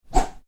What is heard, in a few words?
Swish small fast, Swish: Short, Fast; Whoosh Sound Effects; Whooshes (Sound Effects:Sound Design); Stuart Duffield